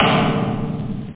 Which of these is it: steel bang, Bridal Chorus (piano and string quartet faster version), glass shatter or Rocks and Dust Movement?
steel bang